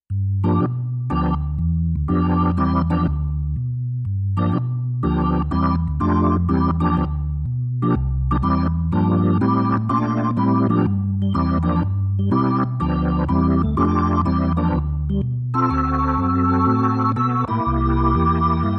Electro Dirty

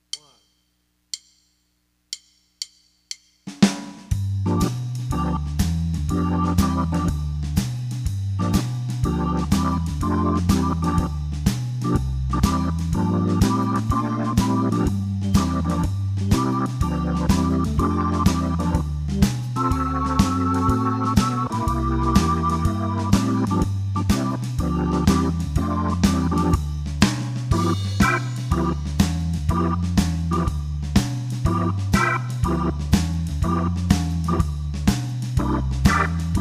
Electro w Drums

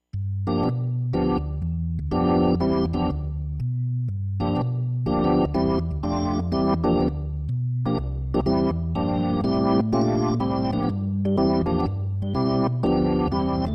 XK-3 Dirty

